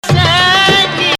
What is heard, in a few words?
2nd Rast, maqam